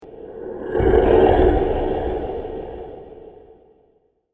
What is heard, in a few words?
Tired But Menacing Monster; fantasy sound effect; Andrew Fleming